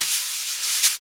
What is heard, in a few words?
SNR XBRUSH, Snr Brushes; Brush&Rolls